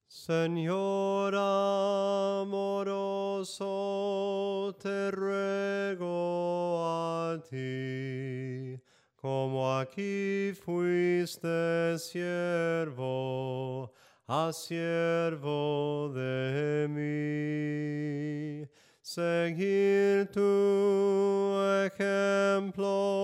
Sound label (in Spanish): SOPRANO CANTADO, himnos en melodia soprano